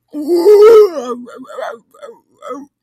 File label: agressive rat bark